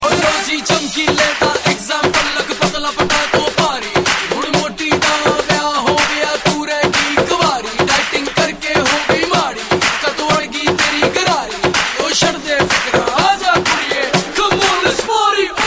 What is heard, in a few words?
Rap